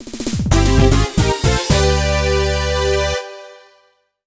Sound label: Spare; Super Monkey Ball 2; Monkey Bowling 2